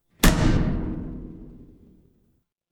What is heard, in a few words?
door close